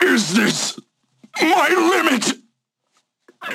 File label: Death; Paladins; Fernando